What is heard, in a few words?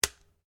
RIFLE ANSCHUTZ 67MSR 22 CALIBRE SAFETY OFF; A selection of domesttic firearms; Rifles; Guns